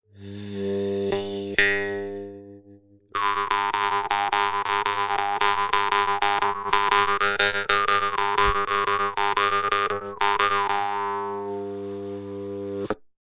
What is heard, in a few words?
Khomus